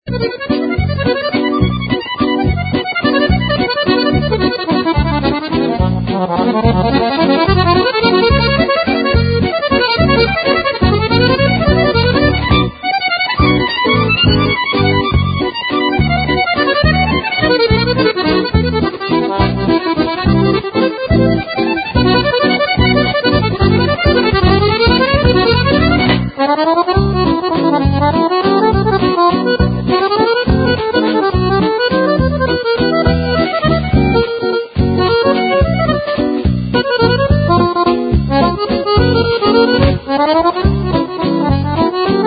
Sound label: Valse de musette